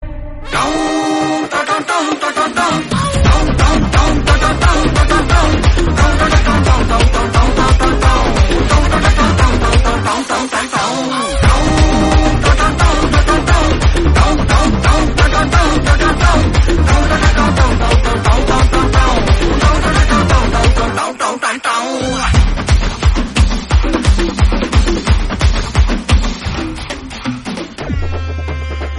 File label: bgm, tamil